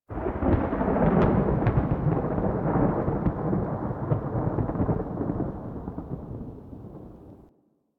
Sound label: Venus Landscape Thunder Crack Low; Ambience; Warframe; Venus Open World